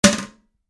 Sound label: Yacht Toilet Lid Close Marine Bathroom Lid Close Down; Transportation Watercraft; Boat; Boat Sound Effects; Blastwave